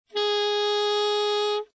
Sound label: A sharp 5 alt, saxophone tenor